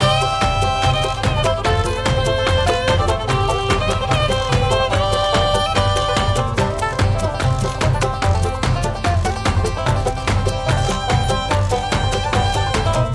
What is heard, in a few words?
Big Win Music Tally Loop; oink Country Love Desktop; Mahi Gaming